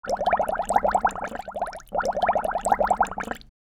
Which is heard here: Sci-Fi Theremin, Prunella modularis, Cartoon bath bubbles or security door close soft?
Cartoon bath bubbles